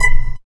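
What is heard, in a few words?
Subtle HH, hi-hat; Sonic Foundry (Sony Creative Software); Esoterik Beatz; Subtle Kit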